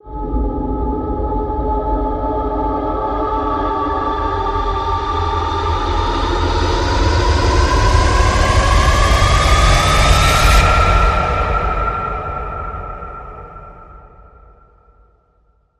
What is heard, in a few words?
Mermaid Ocean Rise